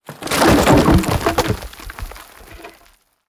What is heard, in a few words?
impacts; World of Tanks